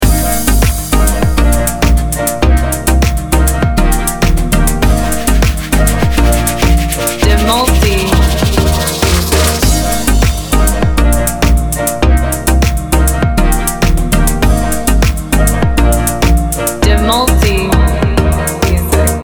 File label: instrumental edm house dance; Energi murni terasa dari beat 4/4 yang konsisten dan bass yang stabil. Lagu ini membuat tubuh otomatis ikut bergerak.